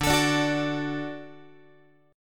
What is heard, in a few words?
D Major D down Guitar; x,10,12,12,10,13